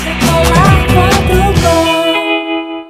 jingle; decolar

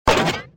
mini pekka step

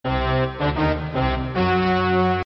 dramatic

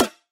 perc

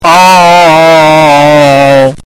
ohhhhhhhhhhhhhhhhhh; baldi

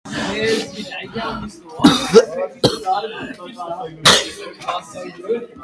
cough

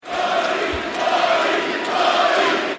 chant 3x

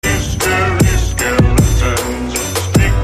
trap remix

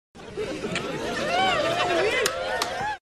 laughing haha

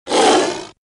lion roar

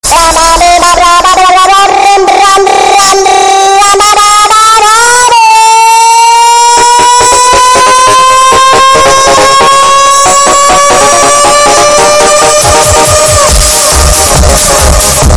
frog bass

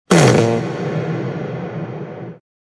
farts; chikn nuggit

